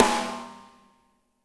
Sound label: MED SNR, SLAM KIT; Spectrasonics - Burning Grooves CD2